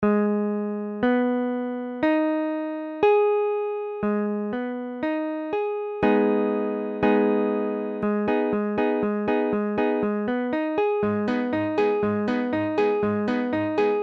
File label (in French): Abm, guitare